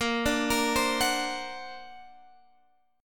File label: D Augmented 7th, chord